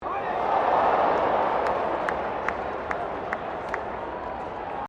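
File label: crowd 40K Melbourne Cricket Ground aww low, Cricket at Melbourne Cricket Ground; Crowds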